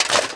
Axe foley; Dota 2

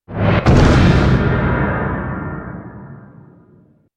Shield Crash; Pangolier

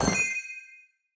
item drop gem shop

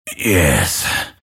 move pain; Grimstroke

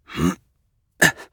Grunt Slow; Realm Royale; Assassin; Shinobi

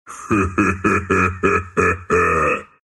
laugh ru; Treant

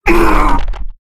Thorn Wound; battlerite

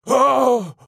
Falling; Paladins; Snek

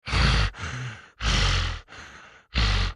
breathing; bloodseeker

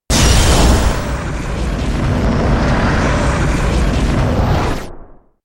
Flaming Lasso; Batrider

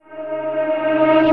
Crystal Chorus Poof pre cast; Colossal Crystal Chorus

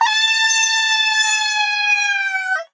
Falling; paladins; Maeve

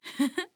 Heh Heh; Skye

